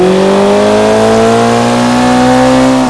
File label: third, vehicles; gmod; tdmcars; xbow